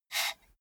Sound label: computer mouse draw short